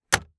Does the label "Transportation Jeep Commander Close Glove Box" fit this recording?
Yes